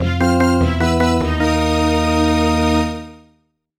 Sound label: Jingle Over; Shoukou Shindan Training DS; NDS